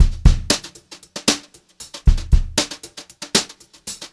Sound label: beat, Brushed Drum Loops; USB Soundscan vol.39; AKAI; 90 s Sample CDs; 116 HOTROD